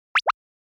Motion Pop

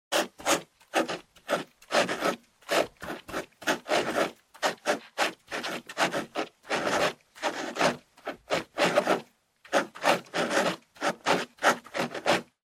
Ballpoint Pen Write

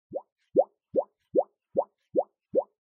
Plastic Sheet

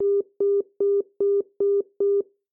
Telephone Signal Tone Dial

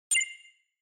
Cyber

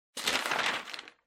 Flyer Flip

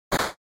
SNES RPG Attack Punch

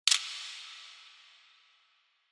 Camera Phone

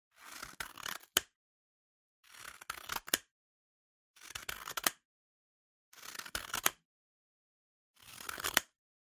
Scissors Cut Paper

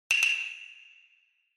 Hyoshigi

